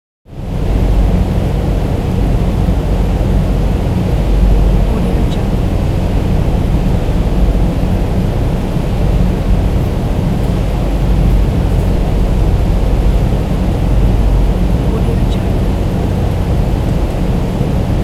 hydroelectric background

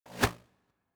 crossbow arrow flyby; future weapons 3; crossbow arrow type 3; soundmorph

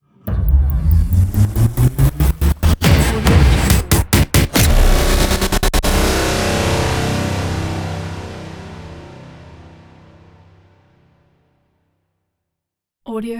stutter risers; soundmorph

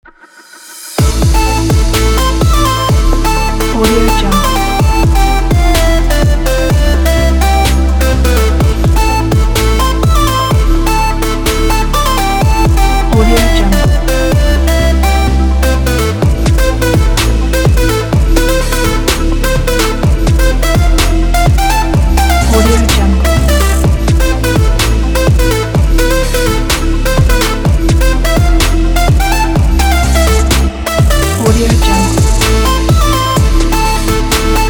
summer breakbeat pop

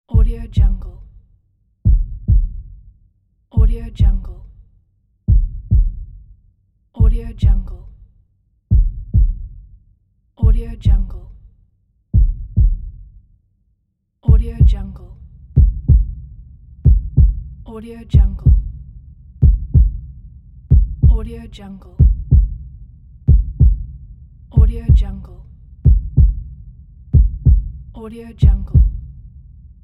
heartbeat pulsation

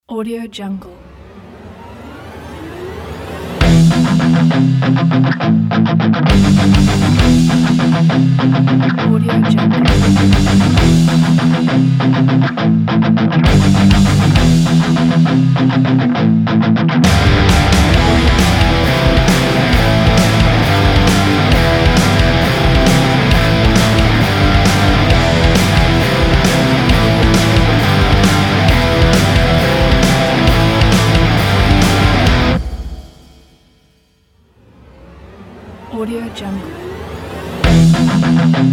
metal hard rock ident

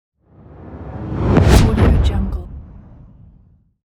cinematic whoosh massive; magicsoundeffects

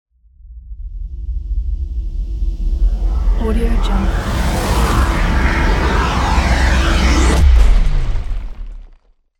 spaceship crash

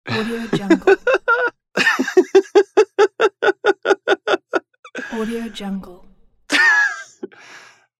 happy man laughs

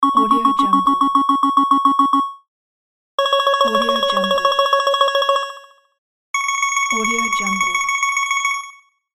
ding ding ding; floraphonic

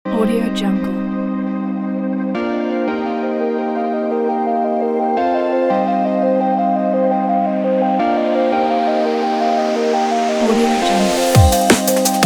atmospheric drum and bass

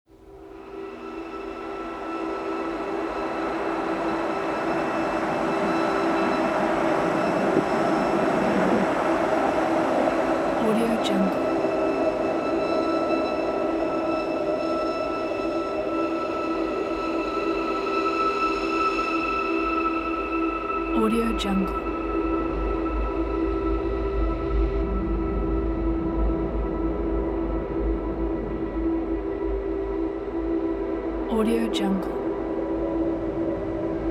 long winter atmospheric soundscape; redoctopus

